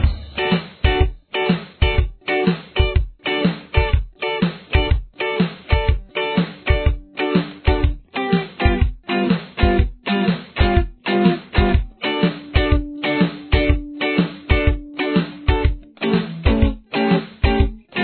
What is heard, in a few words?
rhythm guitar